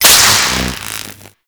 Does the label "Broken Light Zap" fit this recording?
Yes